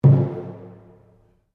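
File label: Drum Taiko Barrel mezzo forte, Japanese Taiko Drum; Miscellaneous Musical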